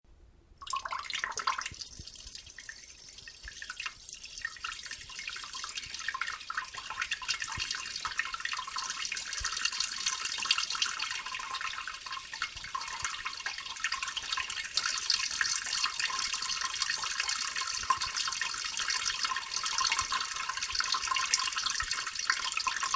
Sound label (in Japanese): ちょぼちょぼしょおお寝起きおしっこ